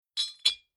Bar Wine Glass Liquor Decanter Closed Light Rattle Two Parts; Drink Mixing